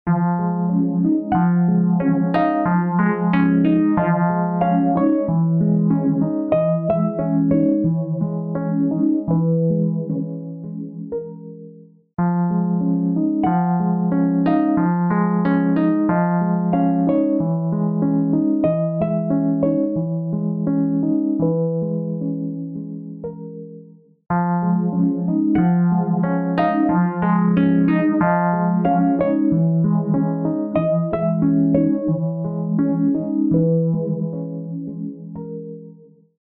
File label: Instant Phaser Mk II Wurlitzer; AlwaysSwaying; Eventide